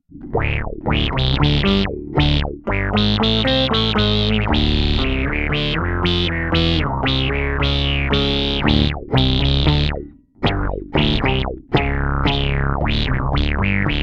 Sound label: Boss SY 1 Bass